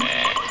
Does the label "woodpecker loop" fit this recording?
Yes